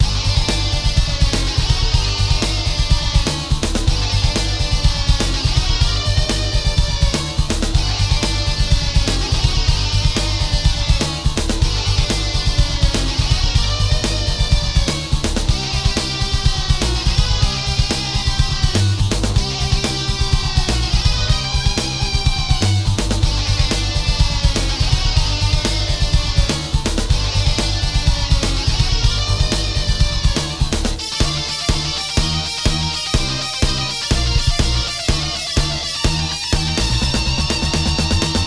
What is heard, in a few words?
SRX; Blood – “A Bit of Blood SRX Draft”